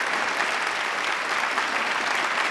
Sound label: Crowd Applause Loop; gamerip